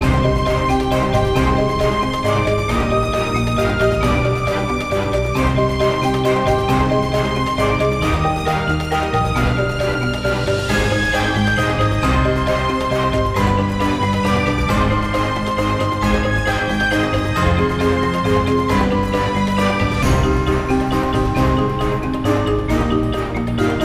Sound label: Ice Expansion Heavy Action Loop